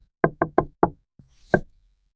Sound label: small head bump to table